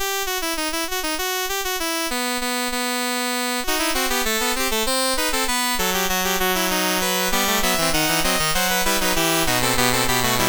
Fugue in E Minor, MOS6581R3

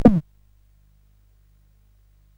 sid CSG8580R5; Prince of Persia

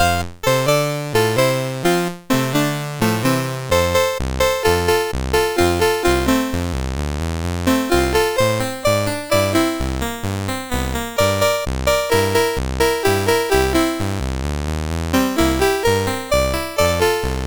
Polka, MOS6581R3

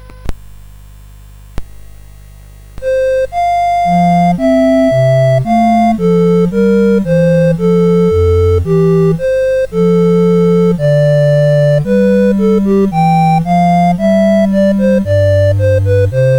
Air, CSG8580R5

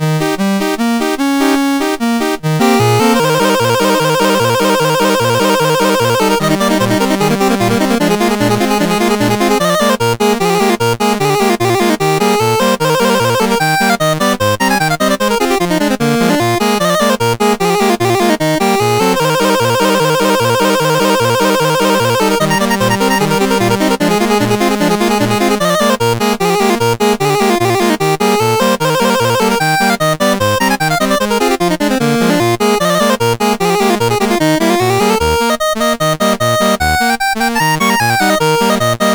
Hora Staccato, CSG8580R5

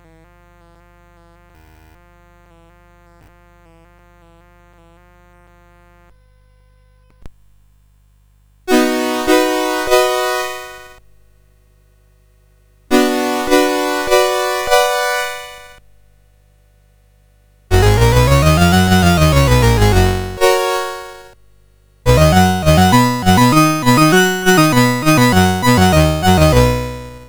Basic Scales and Triades, CSG8580R5

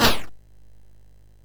sid MOS6581R2; California Games

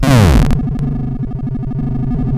Die Alien Slime, CSG8580R5

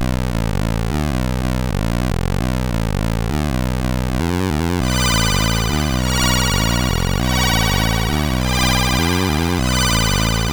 sid MOS6581R4

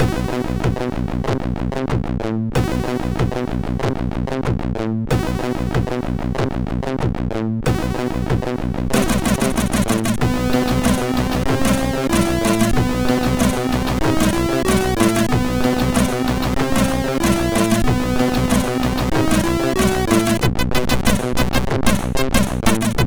Techno, sid, MOS6581R4

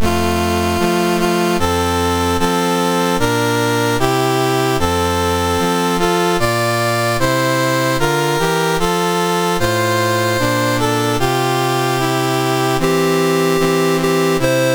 Tonga, National Anthems, MOS 6581; MOS6581R3

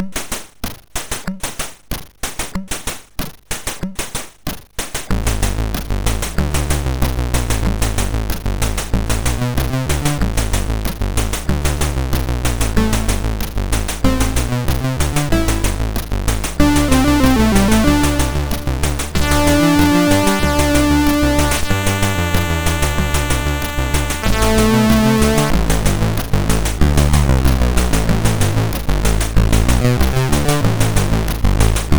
Bad Drums, MOS6581; MOS6581R2

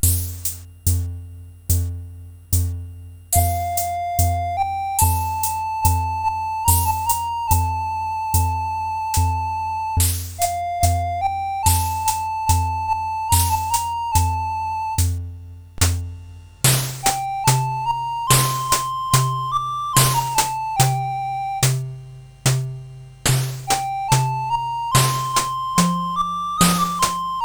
CSG8580; CSG8580R5

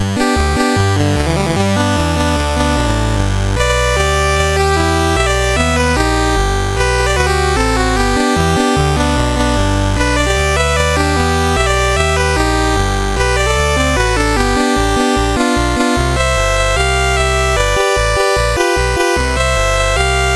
Country Boy, CSG8580R5

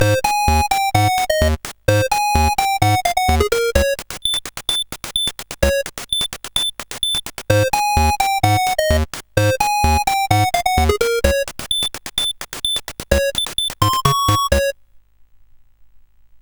sid, CSG8580R5; Coco-Notes

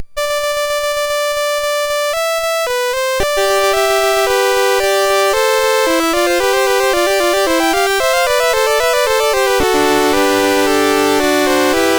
Fugue, MOS6581R3; Fugue VIII 6

